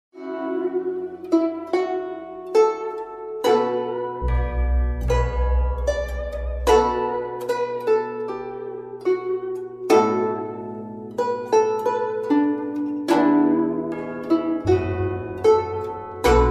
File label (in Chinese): 彩铃背景音乐